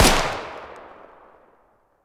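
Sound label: fire distant 300blk rifle exterior; gmod; arccw uc commons attachments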